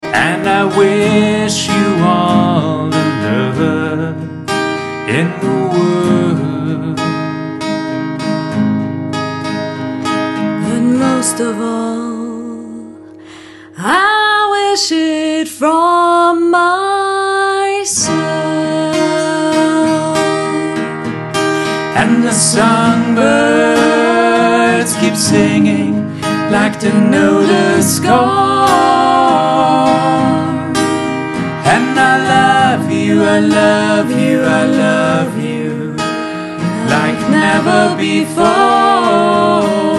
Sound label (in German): Stimmprobe Gesang